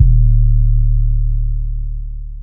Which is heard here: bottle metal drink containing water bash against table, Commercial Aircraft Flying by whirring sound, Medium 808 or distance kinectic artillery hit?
Medium 808